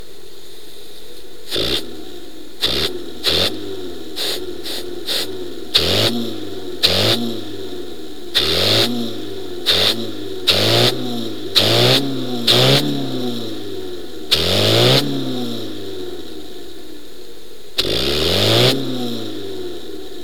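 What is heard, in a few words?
normal at top dead centre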